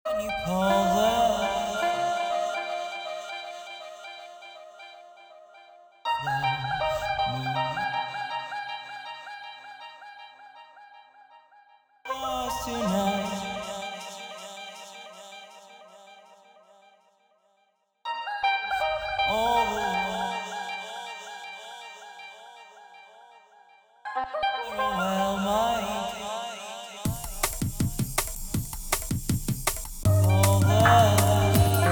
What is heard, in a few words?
steel and siren; weeklybeats 2026